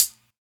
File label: good pedal hi hat sample a key